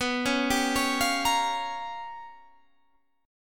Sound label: G 7th Sharp 9th Flat 5th